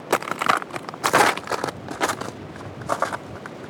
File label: Ice Steps, On The Glacier; outdoor